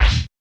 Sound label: U220 FX Set, Drum Machines; Roland U220